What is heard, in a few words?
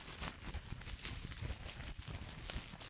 Stuck In Web